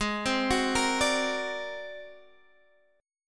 Ab 9th Flat 5th, Guitar, Open G

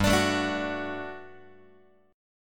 G Major 7th Suspended 2nd G down Guitar; GM7sus2 strummed; x,3,5,7,6,5

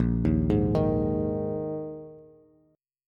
Bb 7th Suspended 4th, Bass; arpeggiated; JGuitar

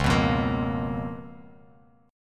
C sharp 13th, Bass Standard; strummed; C#13; JGuitar

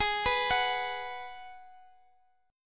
G sharp Minor 7th, Guitar Open F; arpeggiated; G#m7; x,x,x,15,14,13

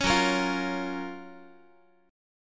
F sharp Major 7th, Guitar; F#M7 Chord; strummed

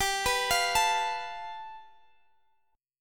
A 7th Suspended 2nd Sharp 5th, chord

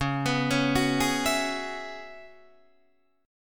C sharp Minor 11th C sharp, Guitar DADGAD; C#m11 arpeggiated

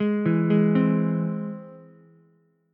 Ab Augmented Ab Ukulele; Ab+ arpeggiated; JGuitar

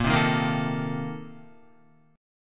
Bb Major 7th Suspended 2nd Suspended 4th, Guitar; BbM7sus2sus4 strummed; JGuitar

